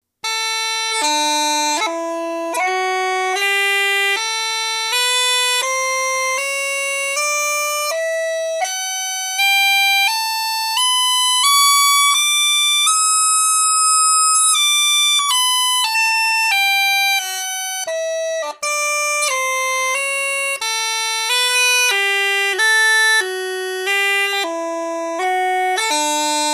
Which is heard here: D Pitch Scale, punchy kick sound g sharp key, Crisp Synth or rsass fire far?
D Pitch Scale